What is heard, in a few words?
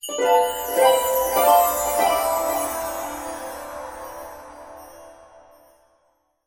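sounds of magic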